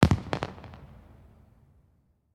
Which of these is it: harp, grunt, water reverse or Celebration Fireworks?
Celebration Fireworks